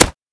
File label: hit marker; Rust